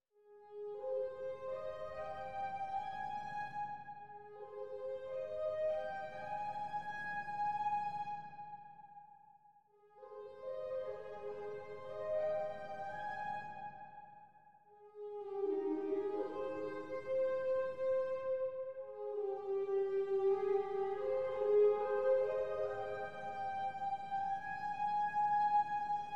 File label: Thunder Rain; Inst. Construction Kits